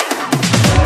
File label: Loops Fills; Vengeance Samples